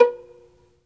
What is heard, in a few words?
SOLO VI, Synclavier Strings; ILIO